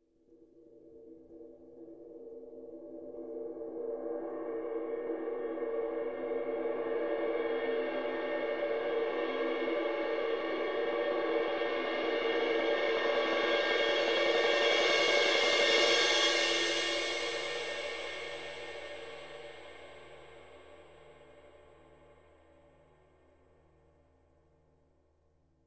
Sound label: Huge Cymbal Swell